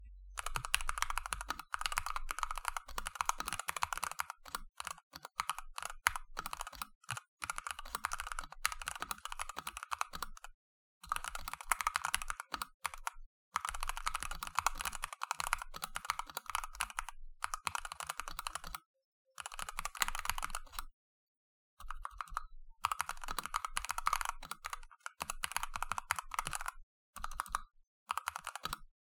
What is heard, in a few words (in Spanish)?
Mountain Everest 60 Sonido